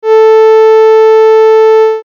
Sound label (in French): tube pression